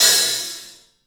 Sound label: HAT, CLUBHAT, 3D Drums; 3D Sound